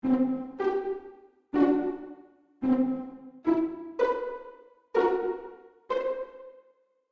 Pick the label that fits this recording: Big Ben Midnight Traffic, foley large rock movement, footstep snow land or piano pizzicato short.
piano pizzicato short